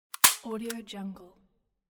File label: staple gun